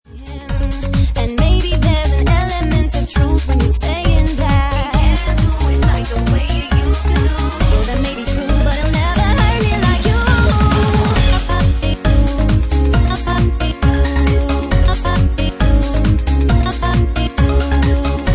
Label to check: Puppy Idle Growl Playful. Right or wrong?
Wrong